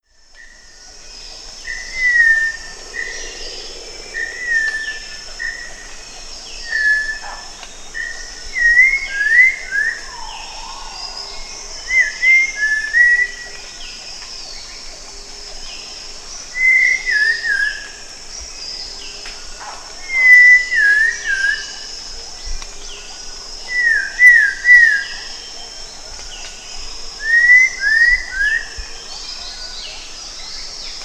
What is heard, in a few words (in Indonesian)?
cempala kuning